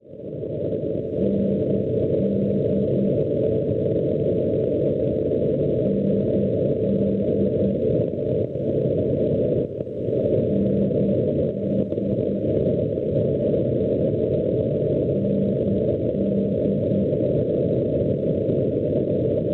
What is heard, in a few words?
NDB, 280 kHz; Swidwin; Polonia; Schwarzsee